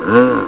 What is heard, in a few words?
bugle